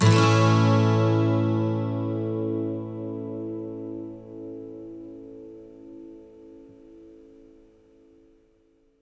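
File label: 12 string major, Acoustic Guitar Loops; USB Soundscan vol.04; AKAI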